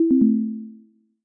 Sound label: Sweeper Scored